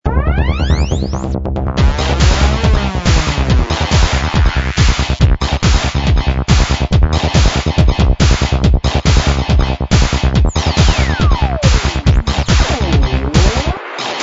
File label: gameloop